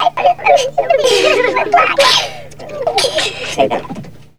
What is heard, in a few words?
VOX FX; Big Beat Samples & More